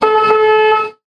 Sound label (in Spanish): tono